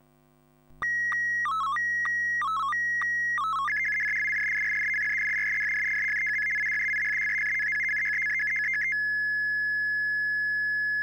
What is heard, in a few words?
ATV125BW Narrow, SSTV Signals; hamradio; AVT